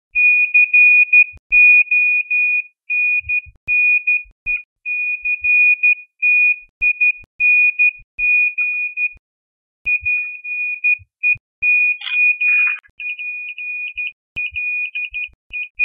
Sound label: ascending, Nokia 3310; zvuk nokia 3310